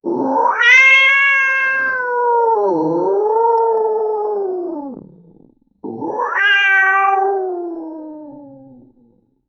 cat and dinosaur roaring; ferocious

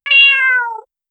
cat meow single for game

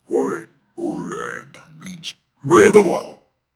in a rpg game with, wizard

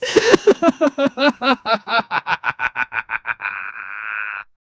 a completely unhinged con, grotesque

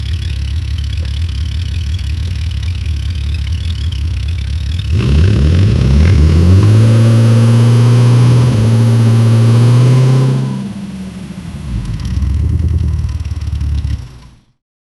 ferrari v12 in 6000rpm, engines

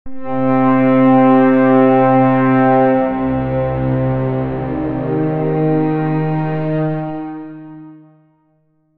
a grotesque ceremonial, ceremonial

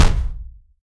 short impactful, melee

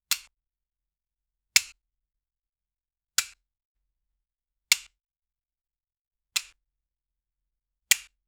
tool hit crafting

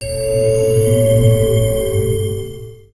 healing spell; aura

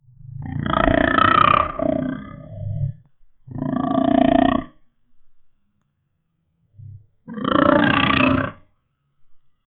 roar of a dinosaur pig, reptile

